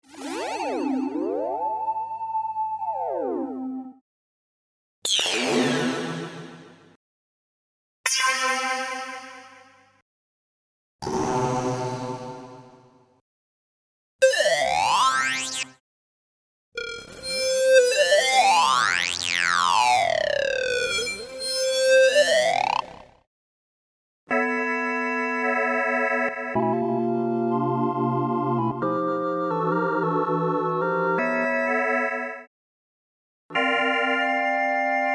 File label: Kaossilator; Korg